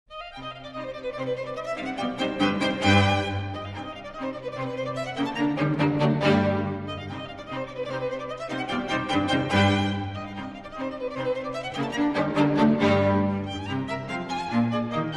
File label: string quartet c minor; beethoven; op18 no4